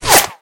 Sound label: Bullet Whip; Weapons; Guns